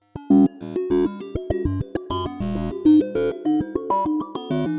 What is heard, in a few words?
Robo Beat 100BPM, sci-fi Beats